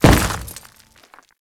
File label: Grineer Step Dirt, Footsteps; Enemies; Warframe